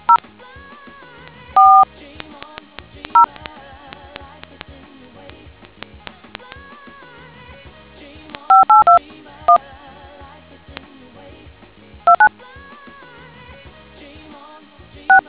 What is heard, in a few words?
dtmf